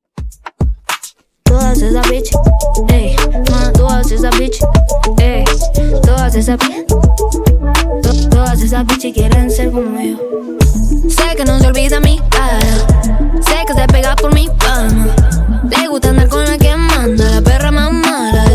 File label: Dj Intro Outro 105 Bpm